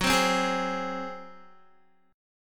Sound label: Gb Suspended 2nd Flat 5th Guitar 4F,4A,5C,5G,5C,6E; strummed